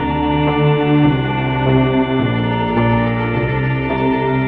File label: strings droned, sad loop; Loops